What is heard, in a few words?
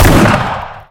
gun heavy; FalloutTower